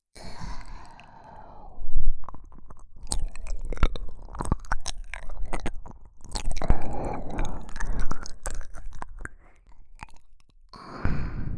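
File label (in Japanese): 耳中舐め（バイノーラルマイク 効果音あり大きめ）, ASMR; 夢魔の宿へようこそ～耳中舐め堕とし～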